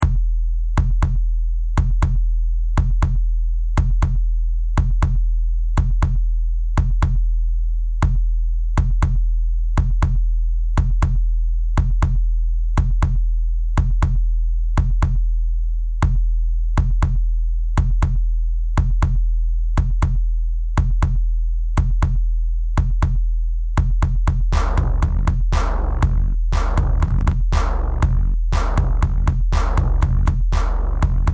Rock It Out Drums

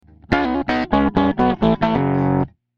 Symmetrical Clipping

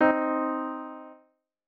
Minor Third in C

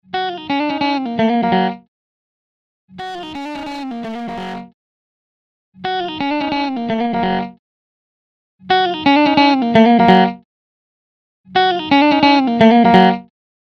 Attack; Chick’n pickin’ lick 2; Compressors

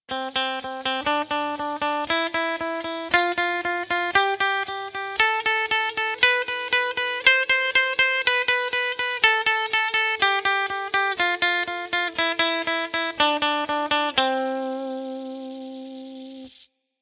scale example

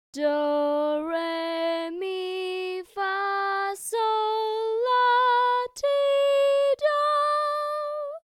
solfa scale; Melody 3

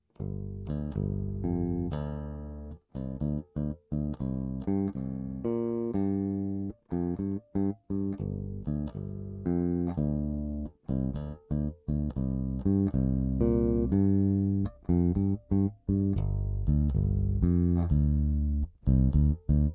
Electric Bass; Bass Tweak; Bass Tone

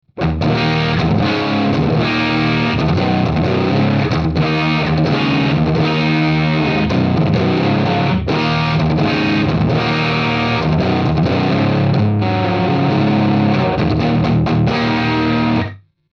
marshall late 60s plexi amp full up; Basic chord progression with suspended voicings

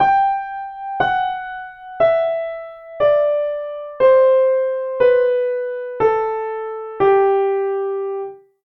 G Major descending; Scale Recognition

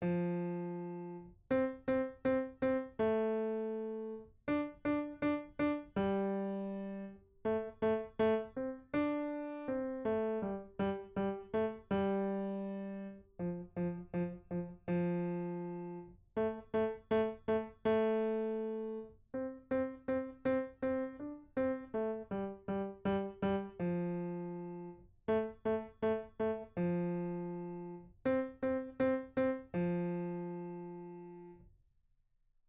piano; Exercise 3

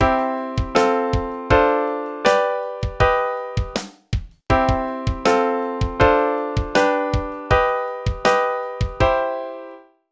Augmented Chord

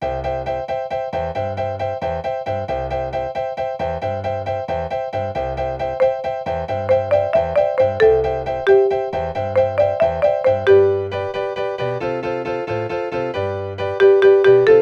Triplet Boogie Woogie

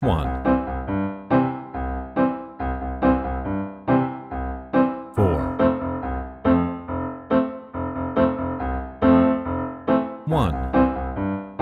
I IV I V piano C

